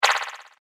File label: Laser Trem O Zap, laser gun, science fiction sound effect